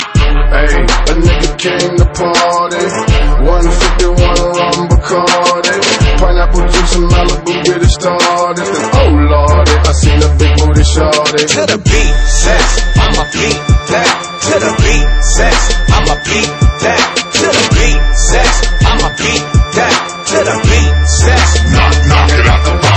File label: the beat